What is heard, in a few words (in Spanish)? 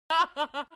risa burla